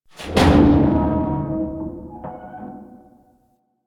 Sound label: Dumpster Creak Groan B; Warframe; Ambience; NineteenNinetyNine